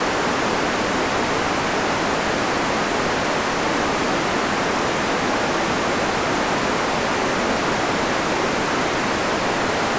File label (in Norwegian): Lavfrekvent stoy maks ved 300 Hz; oresuslyder